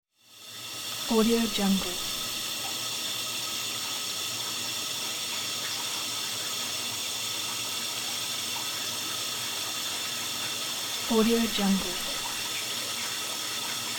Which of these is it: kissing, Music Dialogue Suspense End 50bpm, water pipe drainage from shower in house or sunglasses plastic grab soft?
water pipe drainage from shower in house